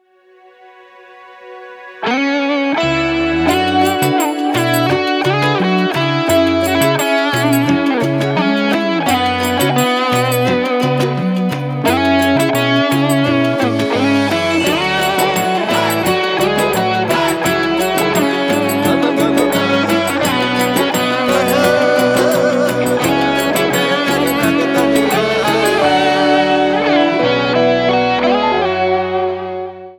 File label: Guitar, ringtones; Walton Primo-NF2-Plus